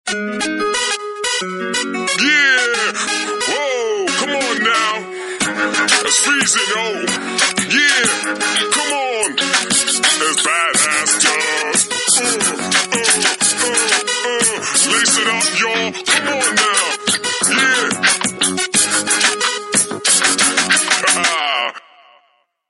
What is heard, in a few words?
Hip hop guy; Samsung GT-M7603